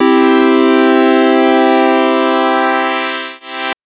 Synth SidPulse maj c3; TrashLibrary

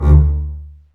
STR CB, STRINGS; Roland - LA Composer vol. 1

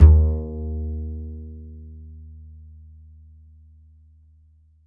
A, upright acoustic bass, A string

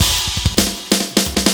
Fill, Drum N Bass Percussion Fills n Breaks; Sonic Foundry (Sony Creative Software); Knutrix Drum & Bass

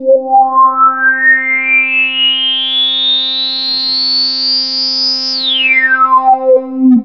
TS10 Analog, Synthesizers; Soli-Music; World of Synthesizers VOL-1

